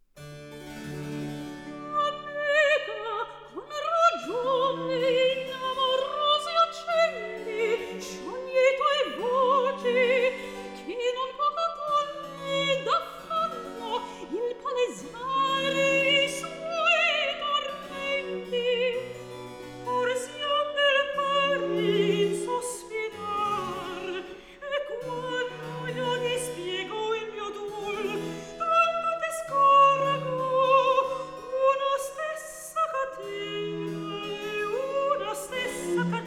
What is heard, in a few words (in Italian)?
Recitativo; Baroque; Alessandro Scarlatti; Duet Cantatas